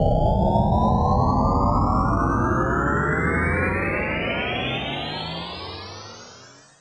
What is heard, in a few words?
spaceship power up; Electro and Synthetic